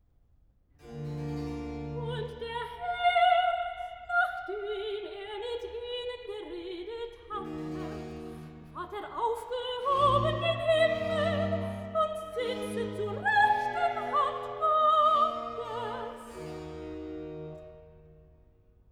Recitative (soprano); Johann Sebastian Bach; Gott faehret auf mit Jauchzen BWV43; Archiv

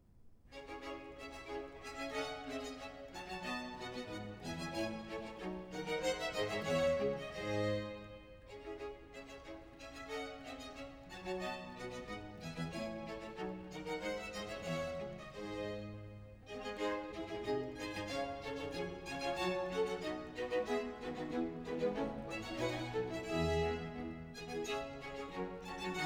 Air; Fourth Act Tune; Choir, English Baroque Soloists, John Eliot Gardiner; Semi-opera Z. 628, "King Arthur, or The British Worthy"

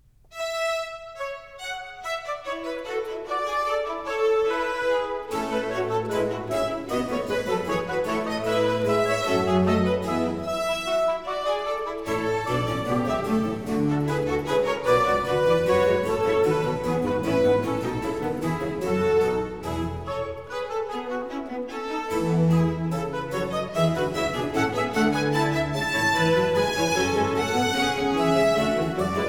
Concerto Grosso in B flat major, Allegro; Georg Friedrich Händel; English Baroque Soloists, Sir John Eliot Gardiner; Concerti Grossi, Op. 3

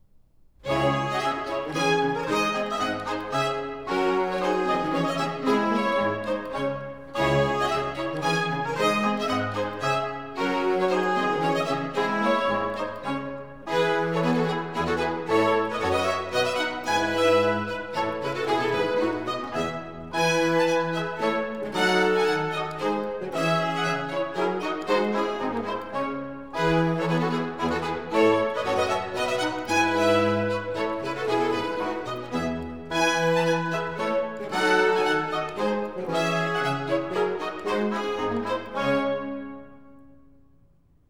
First Act Tune: Hornpipe; Dioclesian, Act I

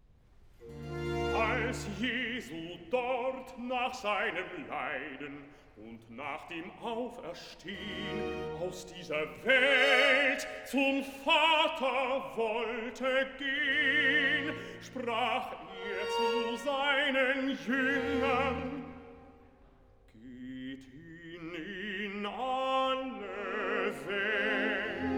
Cantata, Recitativo (Bass); BWV 7 'Christ unser Herr zum Jordan kam'; Bach; London; Feast of St John

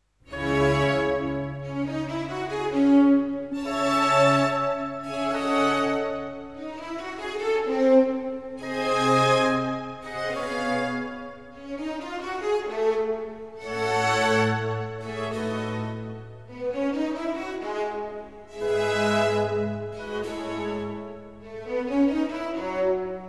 Concerto Grosso in D minor, Largo; Georg Friedrich Händel; English Baroque Soloists, Sir John Eliot Gardiner; Concerti Grossi, Op. 3

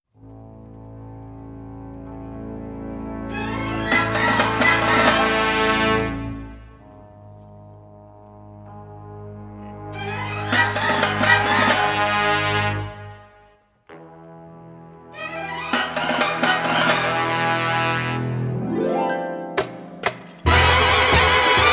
Polka; Egyptian Polka; OSS 117 : Le Caire nid d'espions

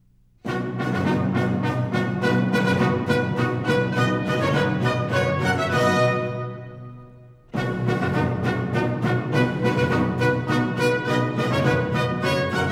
Trumpet Tune; Sir John Eliot Gardiner, Monteverdi Choir, English Baroque Soloists; Semi-opera Z. 630, 'The Indian Queen'

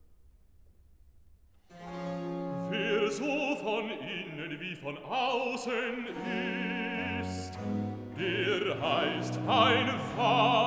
Recitative (bass); Cantata BWV 179; Wer so von innen wie von aussen i; Johann Sebastian Bach; Gardiner

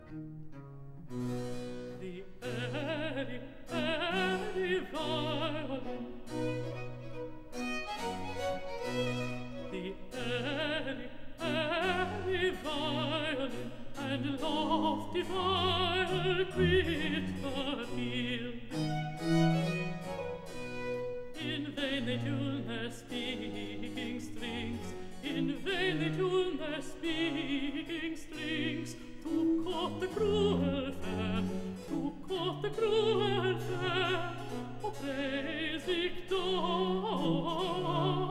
The airy violin; Monteverdi Choir, English Baroque Soloists, John Eliot Gardiner; Ode Z. 328 "Hail, Bright Cecilia"

